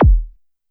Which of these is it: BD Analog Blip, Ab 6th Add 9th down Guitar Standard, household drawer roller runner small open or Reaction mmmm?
BD Analog Blip